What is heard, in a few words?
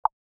beep